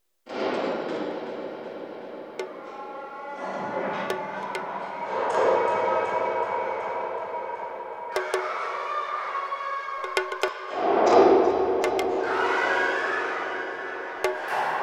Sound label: Domes Ambience